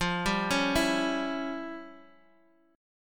E Minor 6th F; Em6/F Chord {X X 3 0 2 0} arpeggiated

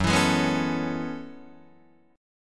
Gb Minor 11th Gb Guitar DADGAD

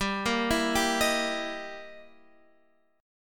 E Minor Major 7th Flat 5th, G

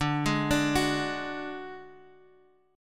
D Major Flat 5th; arpeggiated

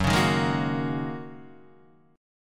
F sharp 6th Add 9th F sharp Guitar DADGAD

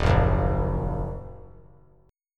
Eb Minor Eb 3C 3F 3A 3D; Ebm strummed; JGuitar

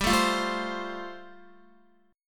G 7th Suspended 2nd Suspended 4th, Guitar

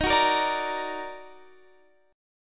Eb Minor Major 7th Eb down Guitar Standard, chord; EbmM7; strummed; JGuitar

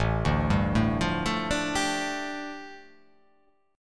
A 7th Suspended 4th Sharp 5th, A, down

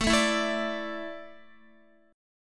A Suspended 4th, Guitar; Asus4; strummed; x,12,9,9,9,10

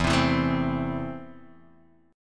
E Suspended 4th Sharp 5th; x,7,10,9,9,7,x,x

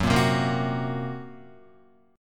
F sharp Major Flat 5th, Guitar; strummed; 1,1,0,3,0,2; 4F,4A,5C,5G,5C,6E

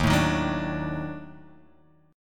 D sharp Minor Major 7th Flat 5th

